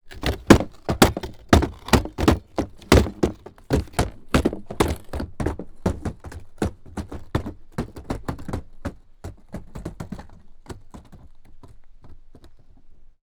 Wood Chest; Foley; Props; Foley Essentials

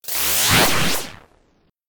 whoosh; Whooshes and sweeps

